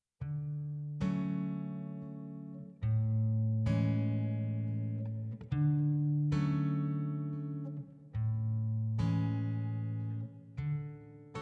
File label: guitar soloist; Gymnopedie; wedding